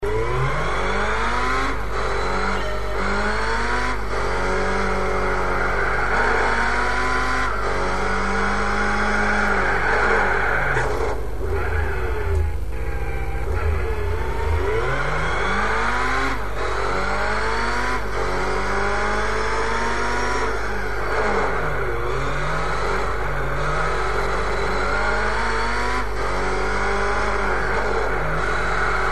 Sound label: camaro race car